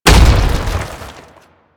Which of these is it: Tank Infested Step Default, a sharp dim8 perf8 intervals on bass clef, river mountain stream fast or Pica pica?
Tank Infested Step Default